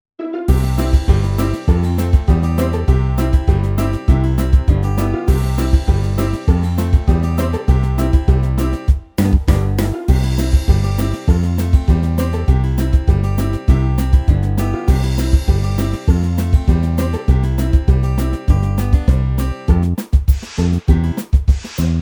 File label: Karaoke